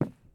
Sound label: walk wood; Escape from Tarkov